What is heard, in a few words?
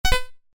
Retro decrease